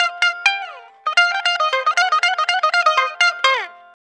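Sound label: electric bouzouki; EL.BOU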